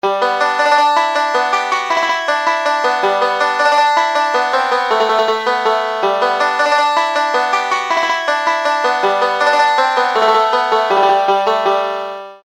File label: midi